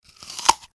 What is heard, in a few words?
apple bite off